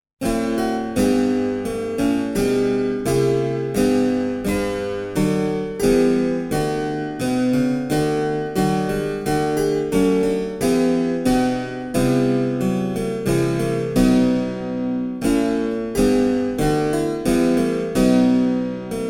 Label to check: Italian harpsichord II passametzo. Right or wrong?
Right